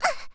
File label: die; Eternal Return; Tia; ENG